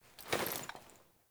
Foley Action Default Melee Heavy Swipe, Default Clothing; Characters; Player